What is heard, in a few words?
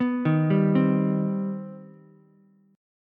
C Minor Major 7th, Ukulele; arpeggiated; JGuitar